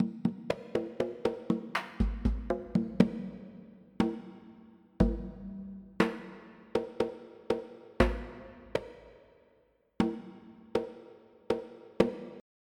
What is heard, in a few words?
Lo Conga